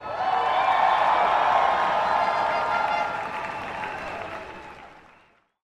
Crowd Cheers and Applause Cheering Horn Blowing and Loud Clapping; Airborne Sound